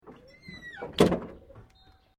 Truck Small Tailgate Close; Generic truck sounds; Vehicles

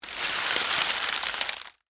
Foliage Crunch, Movement, Palm, Frond; Texture; Rustling; BlastWave

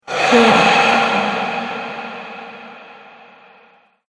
Ghost Breath, scary breathing

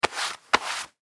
Gloves Leather Work Gloves Hands Rub; Dressing; Human; Blastwave; Foley

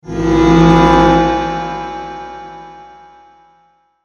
Magical, Reverb, Whoosh, Science Fiction, 5.1

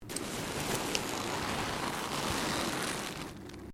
LEATHER MOVEMENT; Material components for constructing more complex sounds; Dressing (Sound Effects:Human)

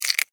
Insect Claw Snap, Movement, Bug; Animal

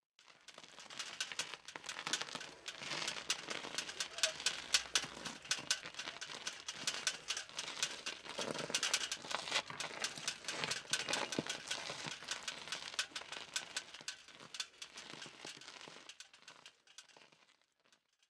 Bicycle Walk On Dirt Bike; Bicycles (Sound Effects:Vehicles); SPORTS; SoundEffects-Blastwave

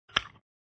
Crunchy breaking of cracking bone.; Injuries; Combat

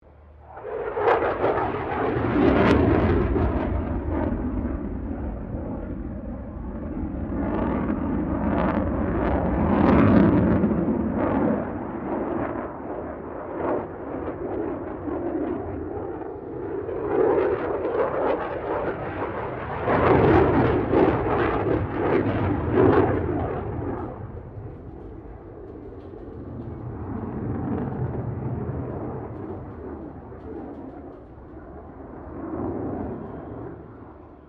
AIRCRAFT F16 FALCON PASS; Airplanes; Vehicles

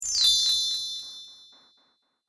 High Chimes Down Delay; Chime Sound Effects

